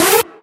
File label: Synths A; vengeance essential club sounds-3